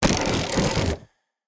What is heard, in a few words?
quick reel spin; finn